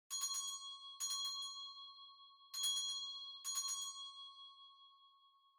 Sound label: Winning Bell Far Gentle